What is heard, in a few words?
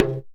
BIG TAMA HIT; Best Service; World Colours